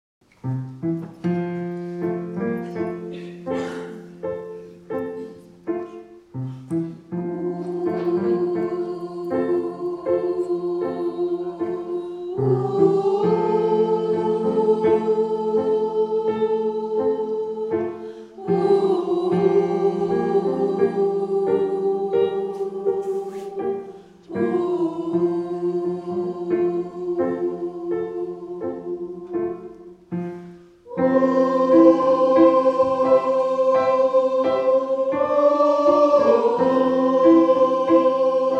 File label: humming chorus